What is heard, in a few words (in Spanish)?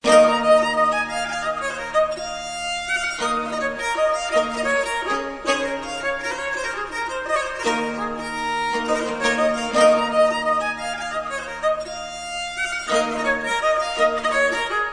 Fidula viola